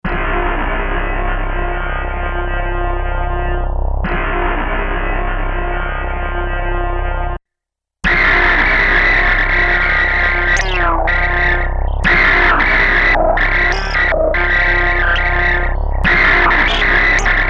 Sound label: Peavey Spectrum Analog Filter Overdrive Max Reso PB Mod